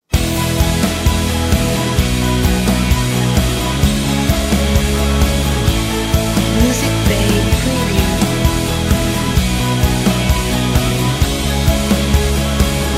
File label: Motivational Orchestral Rock